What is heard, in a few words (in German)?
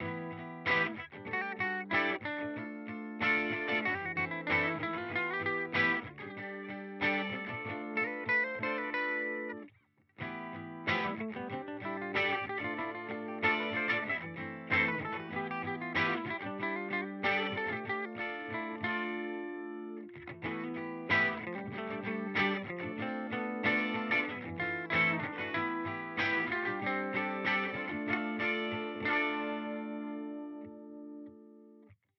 Deluxe Reverb Pur; HX Stomp